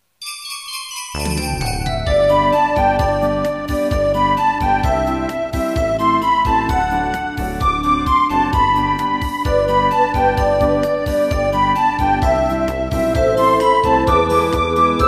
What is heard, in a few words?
jinglebells